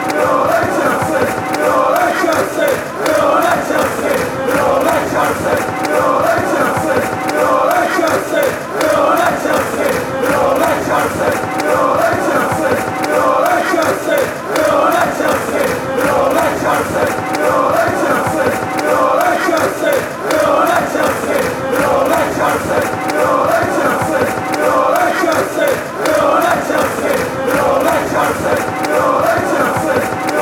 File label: we all hate chelsea fanchants